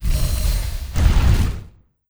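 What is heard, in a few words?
door gate death, monster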